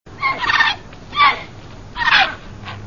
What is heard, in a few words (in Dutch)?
reiger